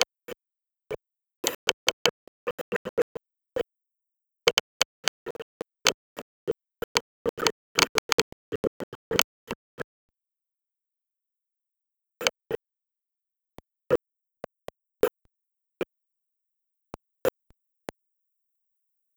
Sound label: UW soundtrack; Fieldrecordings; Sipadan Video Soundwork